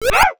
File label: swim; SMB1 Sounds; swim kinopiko